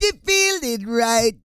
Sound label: REGGAE, Vocal Planet World Voices